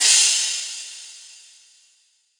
crash loud

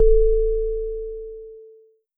bass A

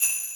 BELL

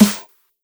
soft hitclap

